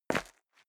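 step dress boots concrete grit single right, footsteps; schritte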